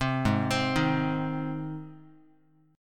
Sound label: G sharp Major G sharp Guitar, chord; arpeggiated; JGuitar